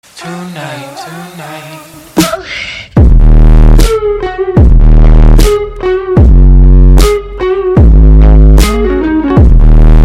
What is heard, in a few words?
bass